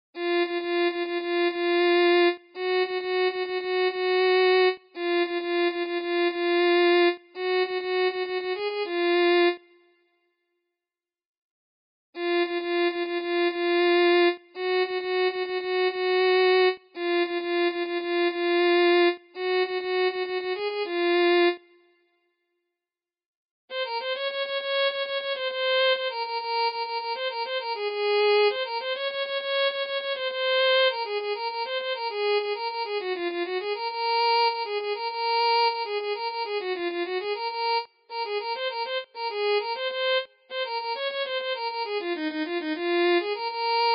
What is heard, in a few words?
Violin